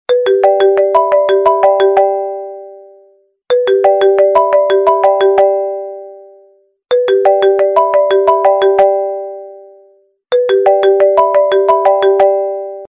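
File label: ringtone iPhone 7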